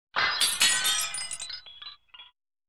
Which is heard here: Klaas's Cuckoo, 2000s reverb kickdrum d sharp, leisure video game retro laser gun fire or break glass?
break glass